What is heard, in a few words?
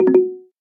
traffic report dring dring; Samsung Galaxy S10; Maps